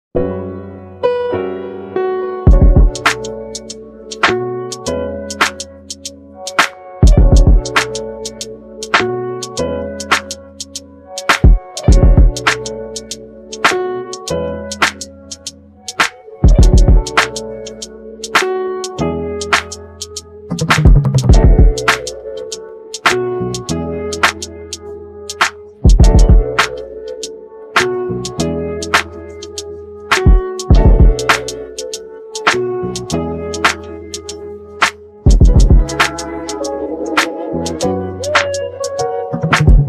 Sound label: Instrumental